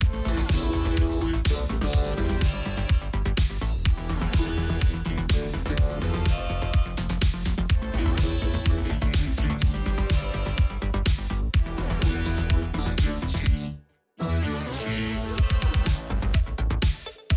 My Drum Machine; little big planet playstation vita

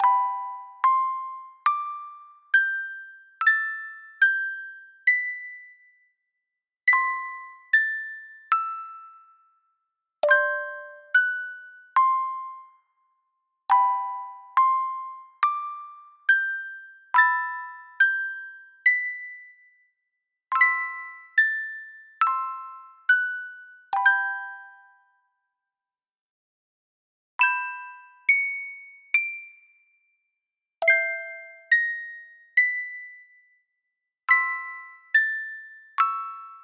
Lullaby (Music Box); animal crossing new leaf welcome aamiibo soundtrack

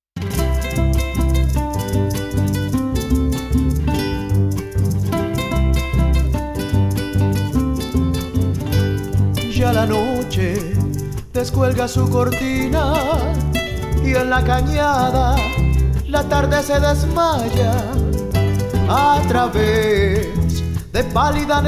Music Vehicle Radio Events

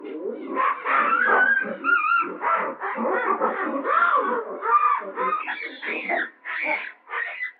Brainwashing Signal; lethal company; ambience; windows; Lrad

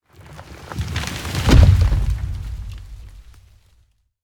Dino Footstep; Angry Birds

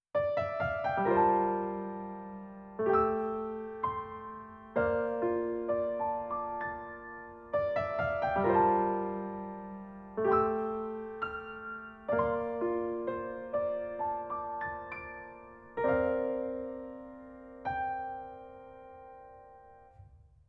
Piano Instrumental

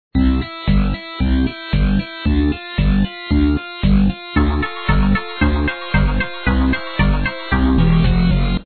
Level Music; SpongeBob SquarePants Sea Monster Smoosh

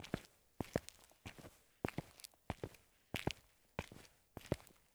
FOOTSTEPS Asphalt Trainers Walk Slow loop; don t be afraid the first toy

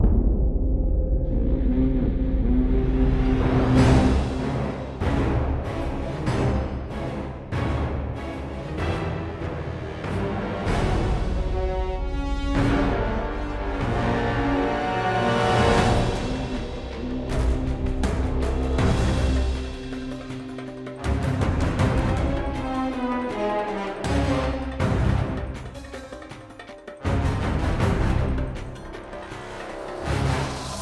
Combat - Cinematic

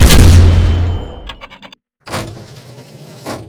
tank shot

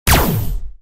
Plasma Gun Shot

